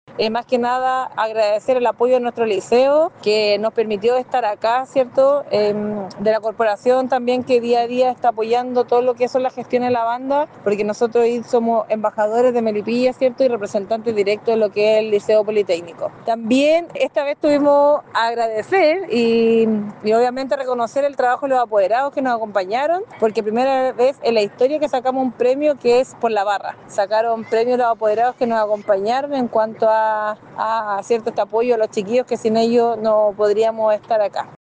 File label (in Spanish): BANDA BATALLON; MELIPILLA; liceo politecnico obtuvo destacada participacion en competencia